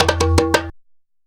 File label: sabar groove; Best Service; World Colours